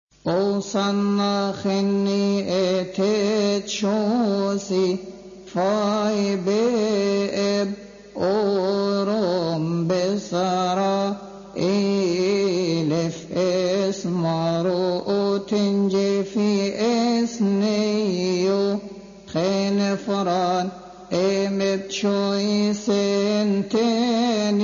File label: marad engil sha3neen